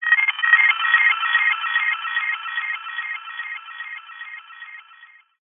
science fiction radio receiver transmission interference burst beeps lo fi delayed